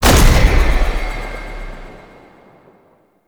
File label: Unhallowed Impact; Warframe; Dagath